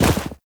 Run Deep Snow, Footsteps; Warframe; Operator

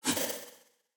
Music Minigame Correct Note

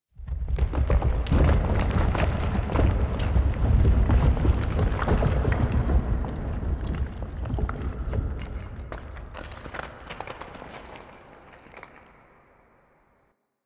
Dungeon Island Cave Crumble, Ambience; Warframe; Duviri

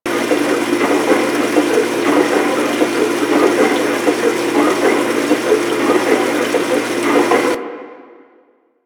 Ocean Steady Machine Loop; Ambience; Props; Warframe; Grineer Ocean

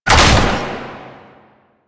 Ranged Weapon Fire; Warframe; Necramech

